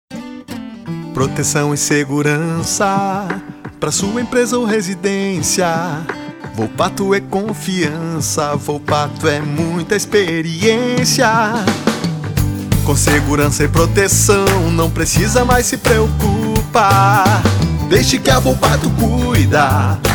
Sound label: Jingle; Volpato; Telefone